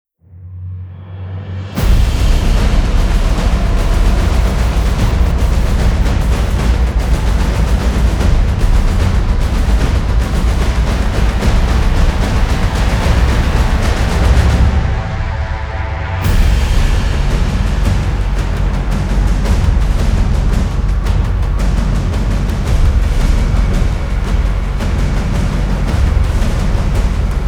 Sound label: Rush Percussion Ver.; Devil May Cry 5; Devil May Cry 5 Original Soundtrack; Suleputer